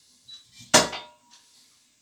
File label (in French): Cloche couvercle